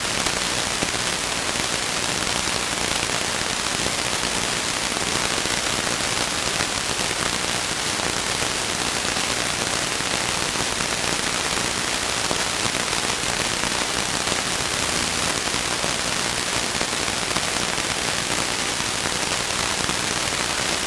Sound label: glitched tones AM Radio Interference